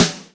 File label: SNARE; East West; Funky Ass Loops